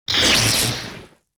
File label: repair, ships